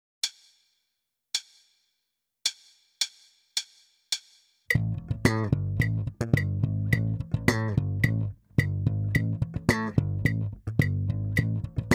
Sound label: Rock Funk Rock In 1/8